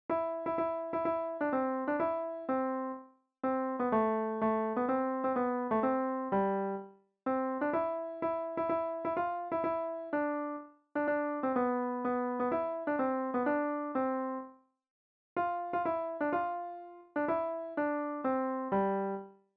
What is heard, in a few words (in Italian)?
canto piano